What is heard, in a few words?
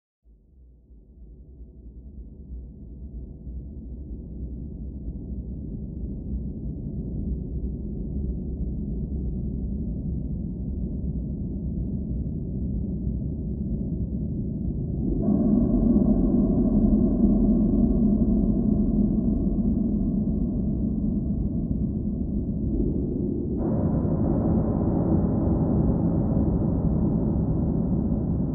noise; enough records